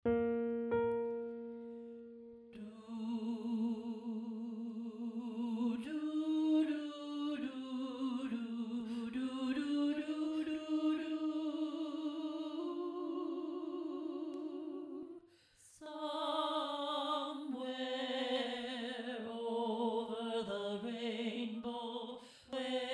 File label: Alto